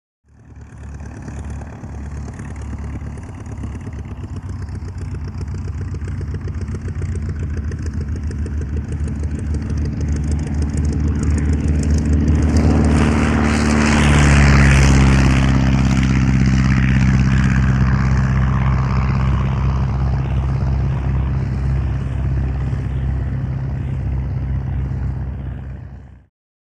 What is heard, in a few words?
Prop Plane; Take Off; Tiger Moth Up And Take Off Overhead. Other Aircraft Can Be Heard In Background But Not As Plane Actually Takes Off.